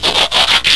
Transform out; Apache; Slide Sounds

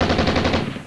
machine Guns; Apache; Roastpork OEMs; Optional PocketHumanity

